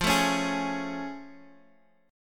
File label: A Major 7th F; AM7/F Chord {X X 3 2 2 4} strummed